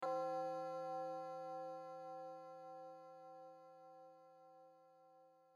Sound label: Chime note C; Glocken